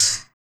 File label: RSS PERC